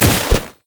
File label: item sightward snowman; LoL